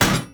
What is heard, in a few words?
Hammer Impact Metal; Weapon Impacts; Player; Environment